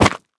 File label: Cave Land; The Inn; Devour 2021 gamerip pc macos